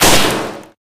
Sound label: crocs jump bite; Source Engine; tf; lair